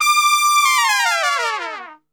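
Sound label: D 4, Brass Super Section, trumpet; Best Service; TRP LNGFLL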